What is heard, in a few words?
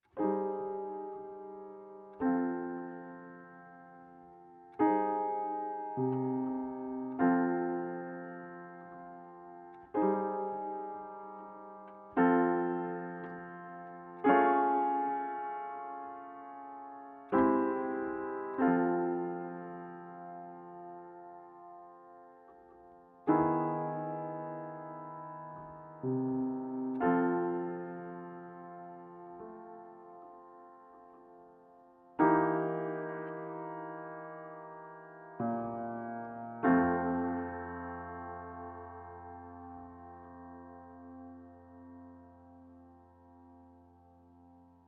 tune